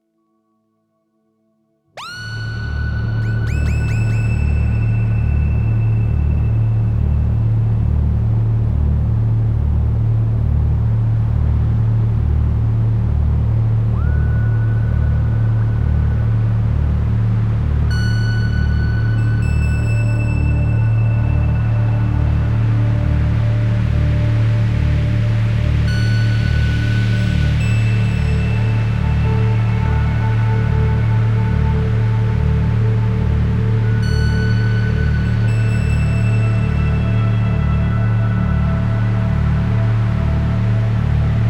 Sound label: korg ms 20 dark; dark ambient droning soundscape; the itsuwari island incident part 2